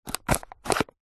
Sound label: plastic disposable cup stab with knife